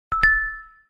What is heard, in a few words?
huawei sms; پیامک گوشی هانر هوآوی